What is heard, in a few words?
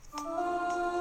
angelic sound for beat idea